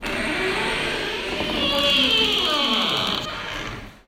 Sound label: Mil Door, Creaky Door, Milano; Creaky Door, Milano 4"; Infinity Room